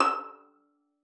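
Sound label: violin pizzicato E6; Xsample VOL-5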